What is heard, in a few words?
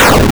explode; breakout game; android java